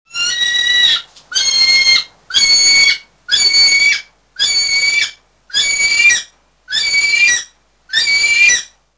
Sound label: angry kiwi; nz